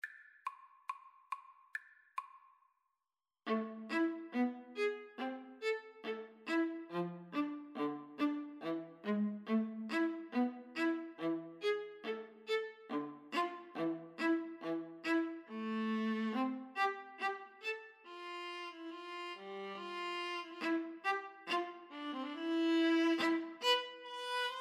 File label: gavotte, Violin Viola Duet; lully